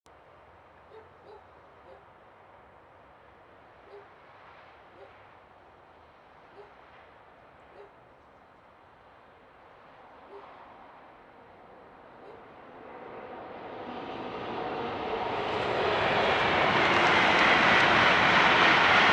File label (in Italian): Treno mono; Eigenmike; A-weighted; Nuova Strada